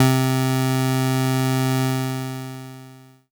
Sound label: Prophet REV2 SYNTH Nasal Low - C; One Shots; Cymatics; Fantasy Synth Sample Pack